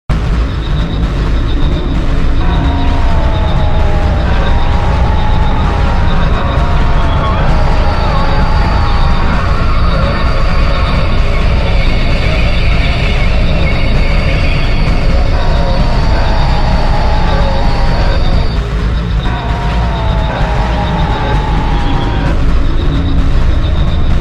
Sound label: Hysteria Squeak